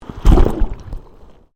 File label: Underwater Movement Surfacing; Submerged impacts created by dropping a weighted rope into the ocean; Splashes; SoundEffects-Foundation4